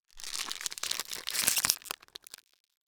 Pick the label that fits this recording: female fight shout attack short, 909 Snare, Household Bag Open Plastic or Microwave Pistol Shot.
Household Bag Open Plastic